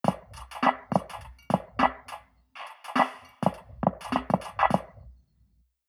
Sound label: drum